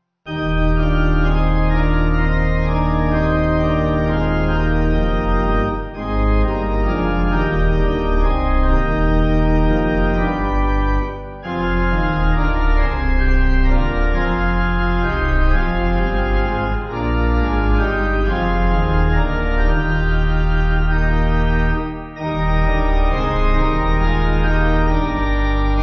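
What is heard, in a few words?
Pipe, Pipe Organ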